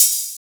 Hat; M8; Electronisounds

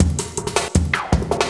Loop, Drum & Bass, 160 BPM

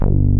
ANA BASS, JV 1080; Pro-Rec - Synthsonic

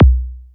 V Kick, E1; 300 Drum Machines

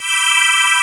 HOUCEY CHORD; AKAI S6000 CD-ROM - Volume 1; SYNTHESIZER

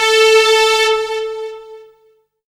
lead syn, Techno Trance

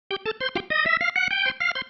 Single Riff, Organ

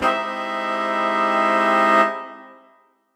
Horn Swell Emin6maj7; undercover samples

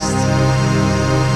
CHRD PAD; Best Service; XX Large Pads; Sample CDs

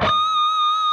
PRS PINCH, Guitars

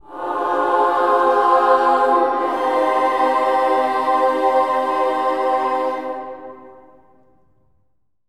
amen; Spectrasonics; Symphony of Voices; BC AMENS

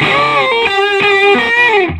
SOLO G, Vintage Blues Guitar; USB Soundscan vol.22; AKAI

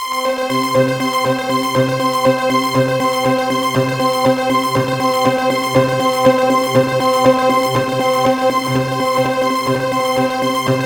C5, SynthLine; 90 s Sample CDs; NiceBeats; SynthLine VOL-7

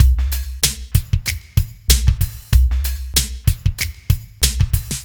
Smooth, R&B Drums

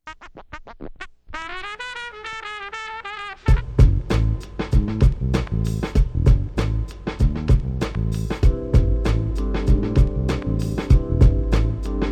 97 BPM F, Hip Hop; InVision Interactive; 40 Oz Phat Slammin

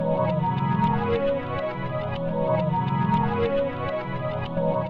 AMBIENT PAD; Trance; AMG - Global Trance Mission VOL-2

